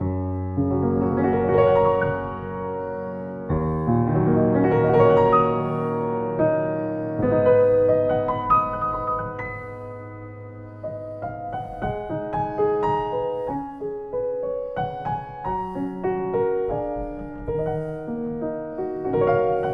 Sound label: Yamaha C3